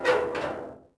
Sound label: cauldron Swing; objects; Star Wars Obi-Wan; XBOX